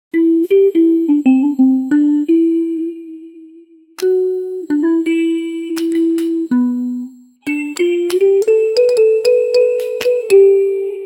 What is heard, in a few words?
create a short 3 bar musi, branding